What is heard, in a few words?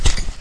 machete miss